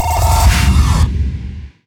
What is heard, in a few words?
Impact Charger